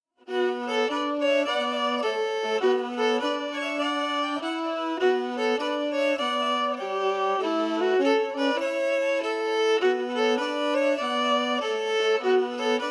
Harmony emphasized

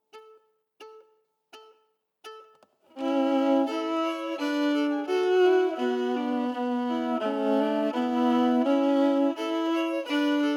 harmonies emphasized

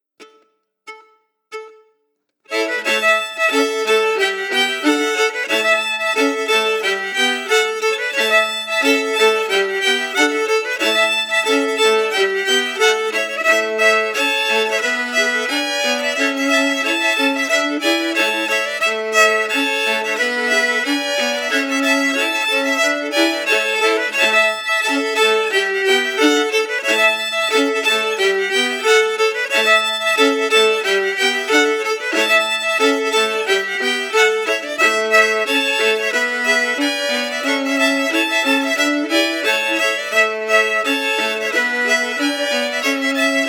harmonies emphasized

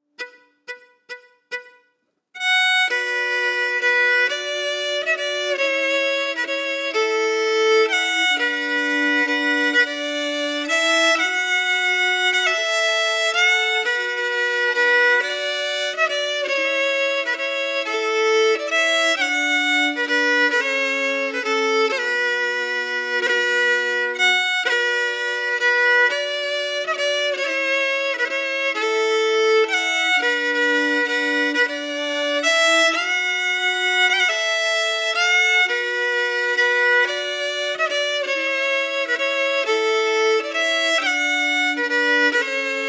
Leaving Lochboisdale melody emphasized

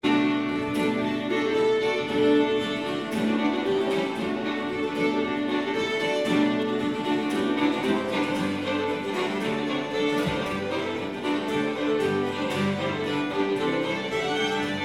Chorus Jig reel

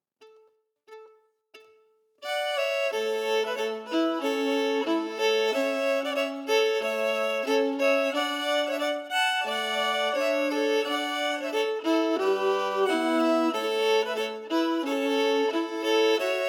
harmonies emphasized

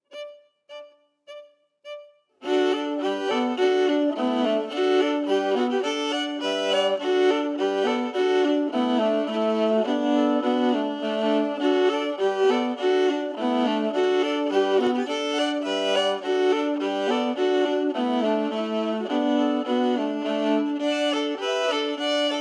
Birds At The Feeder Harmonies

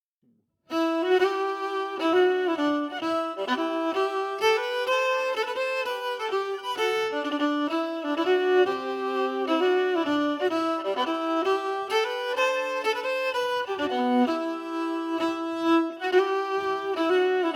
melody emphasized; Grand Etang

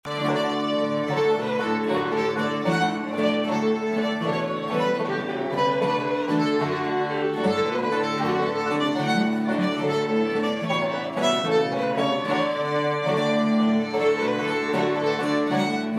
Jig

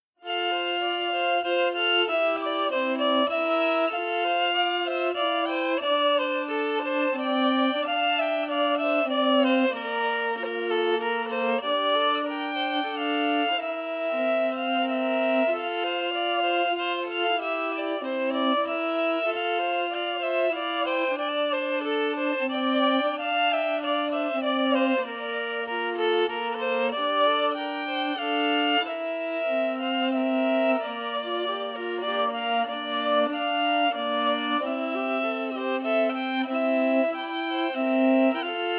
harmony emphasized; Well Hall

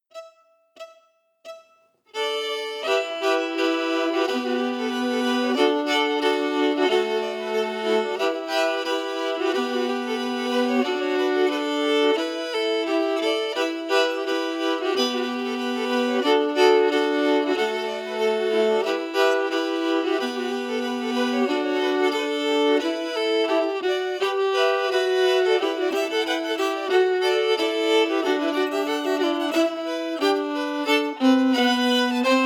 harmonies emphasized; The Old Grey Cat

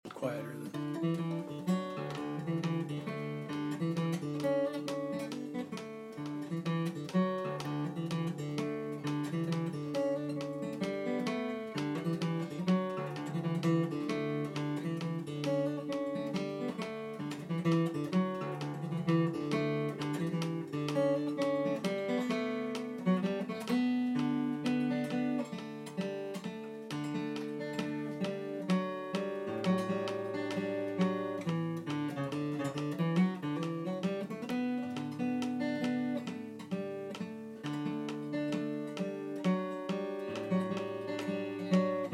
Mazurka

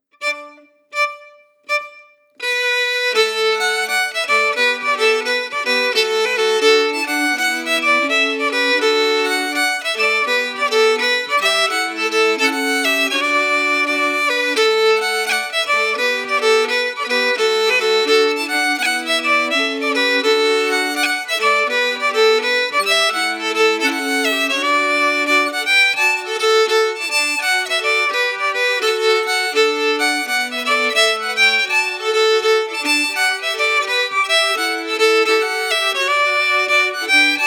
harmony

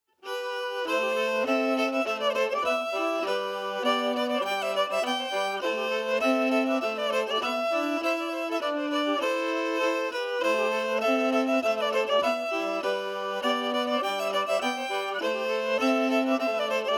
Sacketts Harbor harmony emphasized

